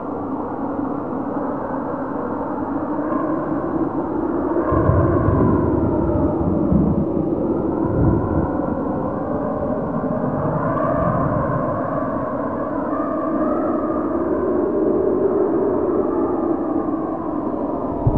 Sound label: Wind Ambience; gauntlet seven sorrows